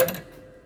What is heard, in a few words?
Prepared Piano; Battery 3 Sample Kits